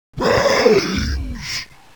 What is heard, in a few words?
zombie brains